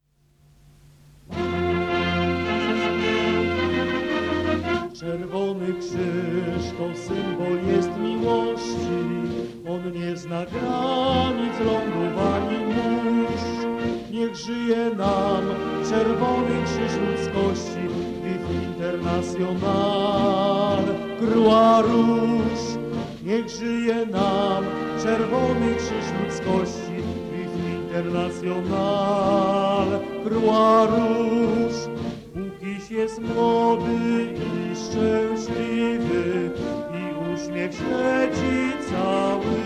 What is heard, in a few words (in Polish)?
hymn PCK; historia PCK